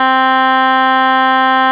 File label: voice